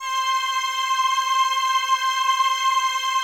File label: digi pad, Pads